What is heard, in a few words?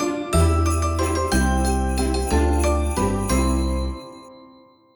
slot intro